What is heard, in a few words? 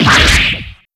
damage in